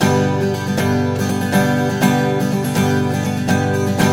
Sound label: Blues Guitar Samples; ILIO; Fretworks